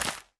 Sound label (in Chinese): 竹笋放置声